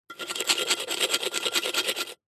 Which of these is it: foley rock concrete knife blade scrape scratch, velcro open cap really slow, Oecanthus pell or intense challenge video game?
foley rock concrete knife blade scrape scratch